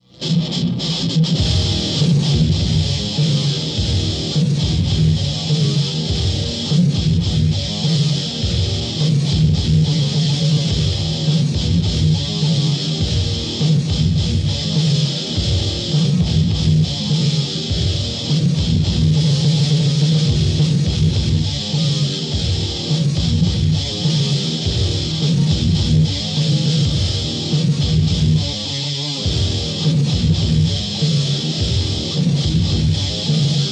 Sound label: Riff Tapes